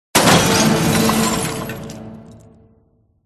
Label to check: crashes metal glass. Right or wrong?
Right